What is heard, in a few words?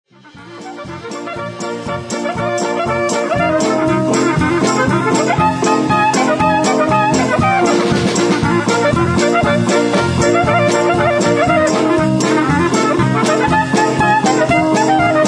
Clarinet Polka